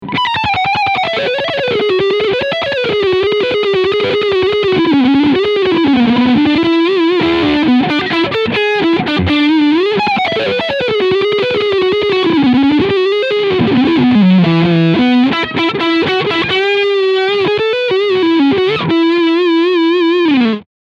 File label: Harley Benton Fusion T HH Leadsound Front Pickup